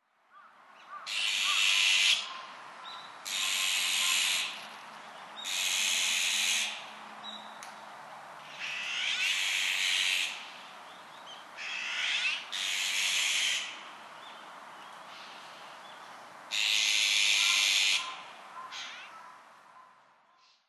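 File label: azure-winged magpie, kokubunji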